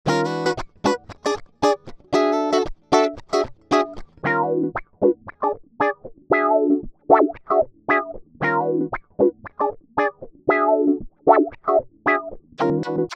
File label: Electric Guitar; Cluster